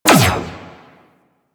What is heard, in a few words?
Laser Fire; Enemies; Warframe; Vomvalyst; Teralyst; DE